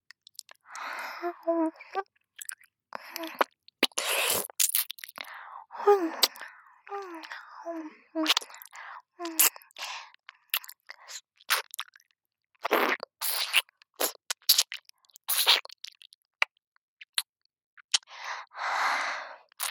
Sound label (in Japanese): 「あまとろ王女の左耳舐め」（ループ）, ASMR; SE有り